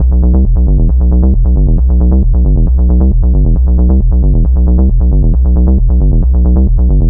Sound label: Bass Loop B 135, Techno; Ghosthack; AC2024 - Day 1 - Techno Sounds 2025